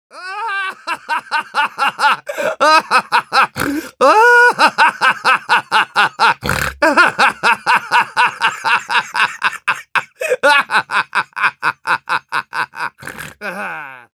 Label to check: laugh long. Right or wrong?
Right